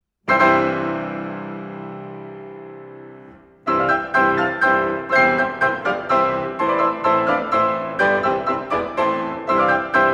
Slavonic Dance in C Major Furiant, version for piano four hands; Antonín Dvořák; Op46No1